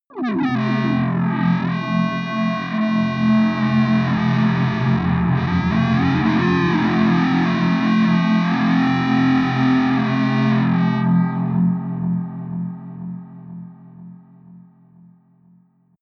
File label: atmospheric feedback distortion hard, drones; musikelemente